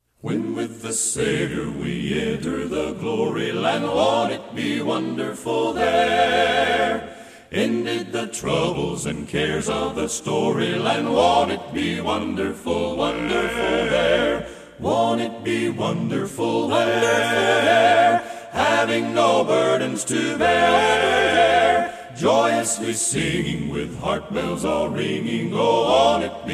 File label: Acapella